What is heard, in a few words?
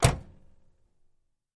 Camaro Door Close, chevy camaro